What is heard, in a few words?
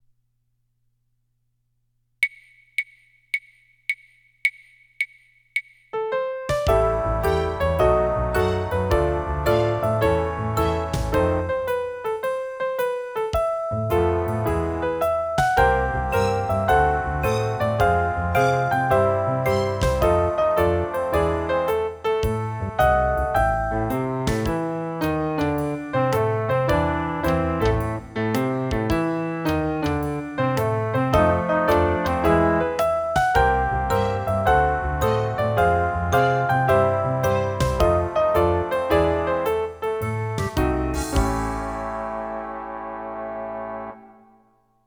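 solo with accompaniment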